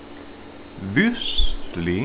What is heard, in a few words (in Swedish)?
vissling